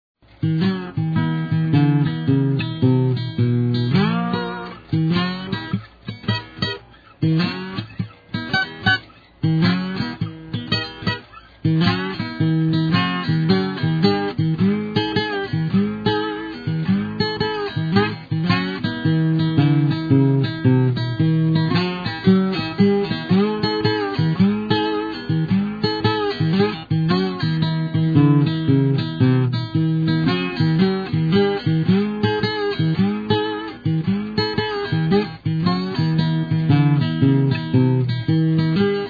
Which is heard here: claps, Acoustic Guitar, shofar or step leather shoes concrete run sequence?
Acoustic Guitar